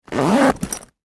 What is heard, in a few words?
Sports Duffle Bag Zipper Open Pocket